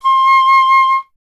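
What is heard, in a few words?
FLUTE PICCOLO C; Miroslav Vitous - Solo Instruments VOL-2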